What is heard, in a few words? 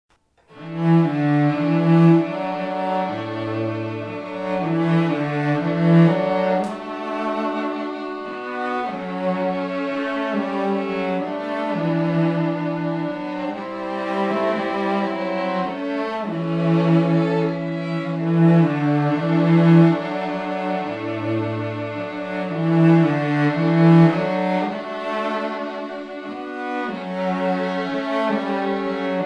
String Trio